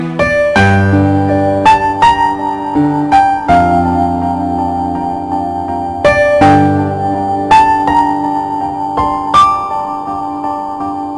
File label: Music